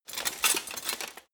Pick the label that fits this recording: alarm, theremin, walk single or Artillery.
walk single